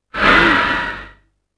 VOICE WA; UFCTapout1; XBOX

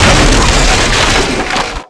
fence break; hits; Tony Hawk's Project 8; XBOX

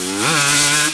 Mid, Engine

